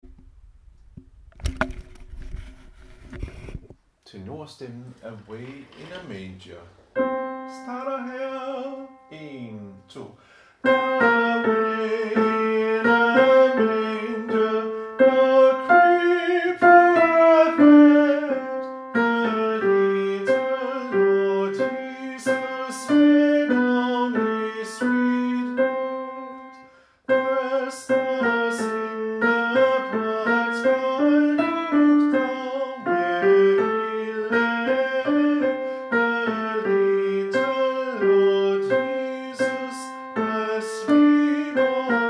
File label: tenor; Away in a manger; harlev koret